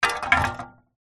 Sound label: industrial shovel metal set down concrete ground